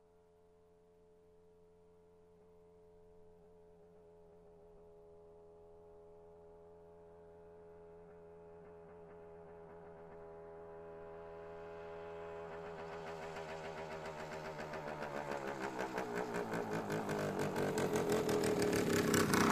Motorcycle approaching, turning off

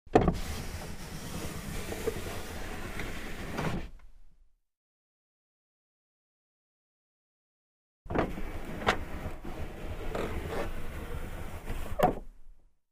Car glass lowered, raised manually

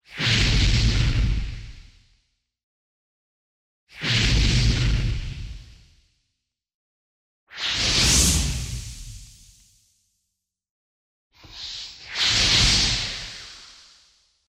flame flash; fire

